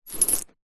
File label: money coins small x4 pick up from carpet; foley; zapsplat